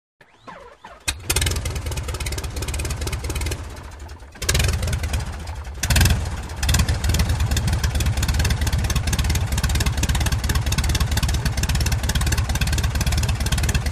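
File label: Electric Start / Idle / Throttle / Off; D.H. 82 Tiger Moth. Wheezing Start, Very Low Idle And Revs, Off With Slow Wind-down. Close Perspective. Prop Plane.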